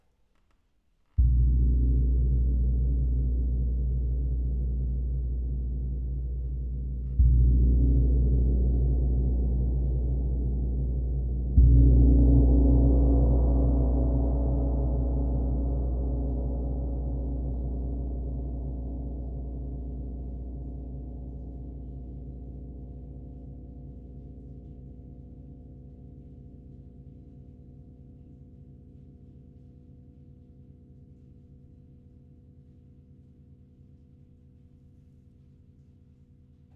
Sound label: Gong